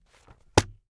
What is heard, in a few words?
Newspaper Drop